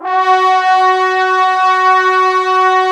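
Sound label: brass trombones F#, Woodwind Brass Ensemble; 90 s Sample CDs; Miroslav Vitous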